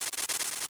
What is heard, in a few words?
bat; Open Zelda; Quest Designer 2.0.4b